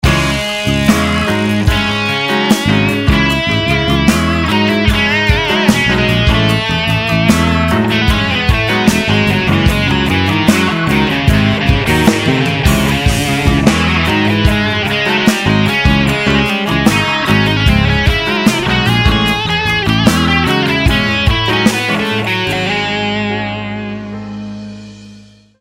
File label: Guitar Solo; Ringtone; 26sec